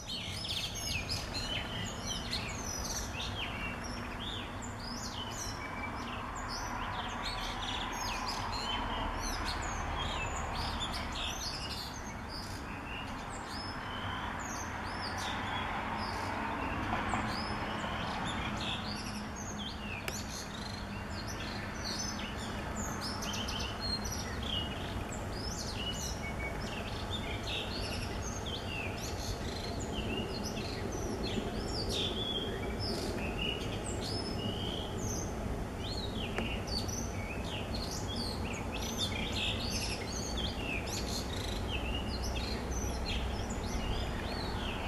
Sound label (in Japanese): オスのさえずり音声のみ